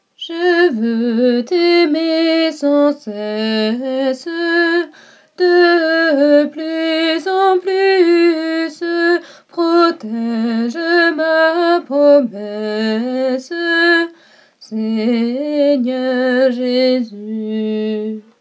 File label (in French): Contre alto; Chant de la promesse scoute; Refrain